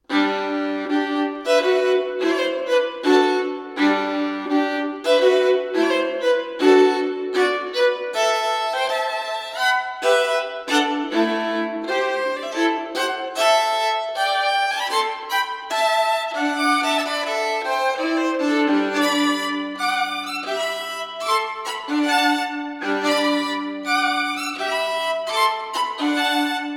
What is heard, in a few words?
Violin Sonata in G Major Danse rustique